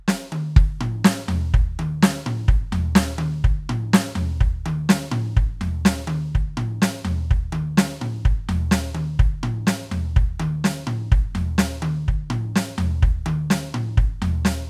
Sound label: roland drive pattern, drums